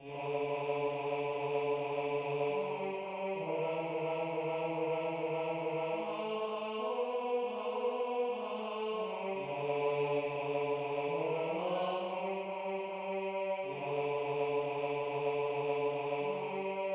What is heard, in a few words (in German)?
Lied Gesangklang